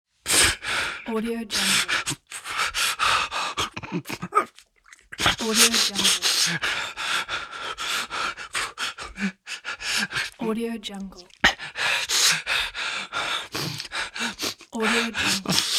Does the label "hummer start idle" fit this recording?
No